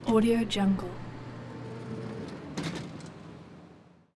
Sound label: airport electric door noisey airport terminal; urban sounds